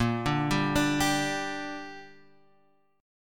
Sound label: A sharp 6th, Guitar Half Step Down